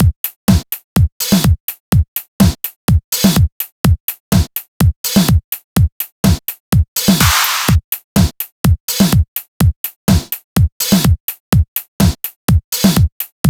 Mixed Beat, Afterhours EDM, Electro Shock; Sonic Foundry (Sony Creative Software)

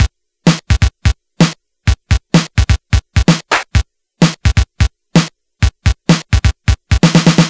Game Boy Break Loop, 8-Bit, Drums

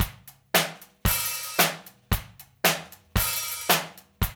DRUMS, Funky Ass Loops; East West

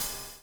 BUBBLE; Spectrasonics - Backbeat

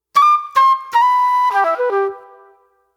Soul Plate Flute

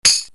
LINN CL HH

